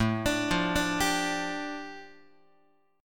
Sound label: A 7th Suspended 4th Sharp 5th, A, down, Guitar